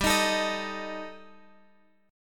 G Suspended 2nd Sharp 5th, Guitar Open F; Gsus2#5; strummed; 19,22,21,22,x,x

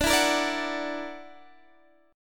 Gb Augmented 7th D

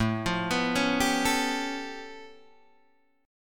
A Augmented 9th A Guitar Open D

